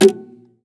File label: Badly tuned guitar; SFX; 7rystan's 600+ FREE EDM SAMPLES & DRUMS